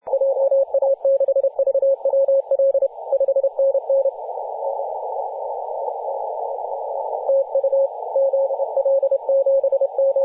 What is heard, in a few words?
18.075 MHz CW